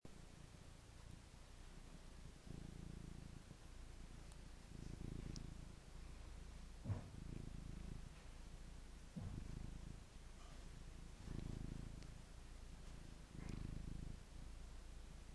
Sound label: cat purring